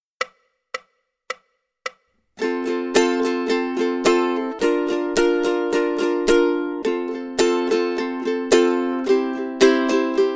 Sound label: rhythm study, ukulele; grade2